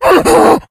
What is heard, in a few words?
shoved, npc infected; Left 4 Dead 2; Source Engine